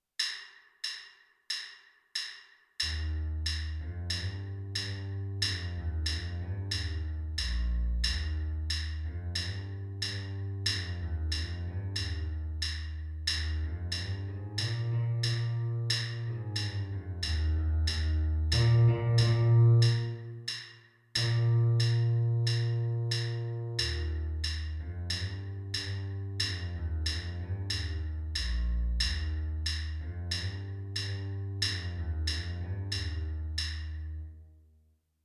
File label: alouette electric bass